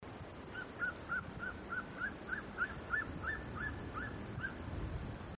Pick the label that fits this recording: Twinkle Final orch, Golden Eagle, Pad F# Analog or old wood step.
Golden Eagle